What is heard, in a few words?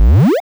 powerup; ui game